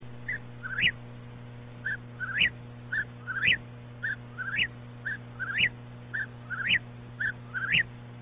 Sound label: whippoorwill; animals